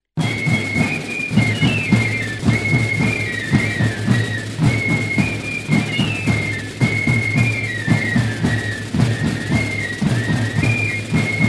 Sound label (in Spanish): Tamborrada; Alarde; Irun; Diana; La música del Alarde